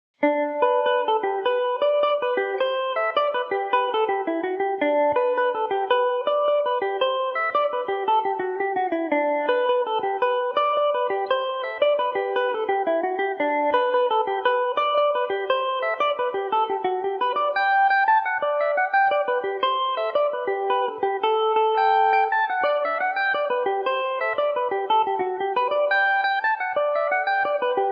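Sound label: G major, Irish mandolin; eavesdropper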